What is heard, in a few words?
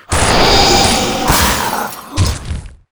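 4 leg skeleton death, monster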